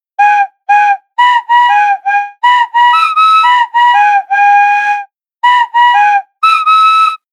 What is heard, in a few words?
Child Playing Whistle Loop; loopable